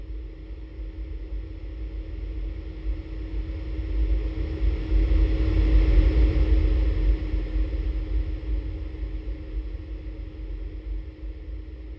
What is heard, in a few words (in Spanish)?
sonido coche funcionando